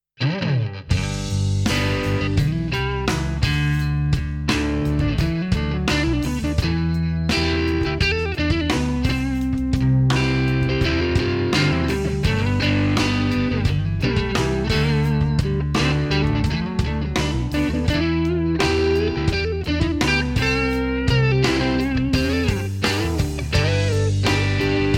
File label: guitar works